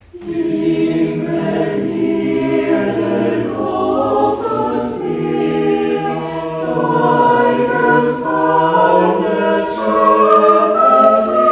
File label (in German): Chor Himmel Erde; Gottesdienste zum Nachhören; Jubilate